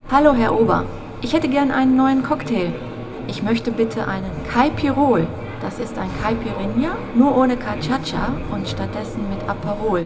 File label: Cafeteria